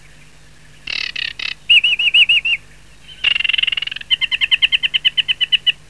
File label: Acrocephalus paludicola